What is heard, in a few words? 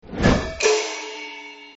Ox Stop Add Score; CrazyOx